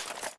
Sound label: Idle metal small